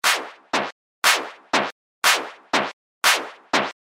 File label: rhythm loop Elastic flanger; Sound Graph